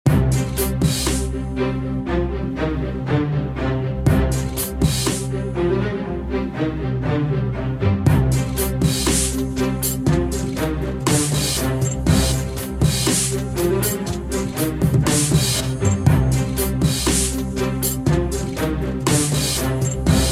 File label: Epic Cinematic Electronic Futuristic Action Movie Theme